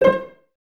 CES C Pizz, Cellos; Miroslav Vitous; String Ensembles

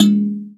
KALIMBA; Spectrasonics; Heart of Africa; KALIMBAS

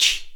VOCAL, Synclavier; ILIO; Synclavier Strings

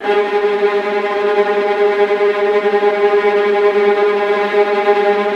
VIOLINT A#3, Synclavier Strings; ILIO

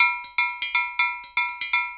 Island Bash; Spectrasonics - Supreme Beats - World Dance

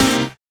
Synths Root C

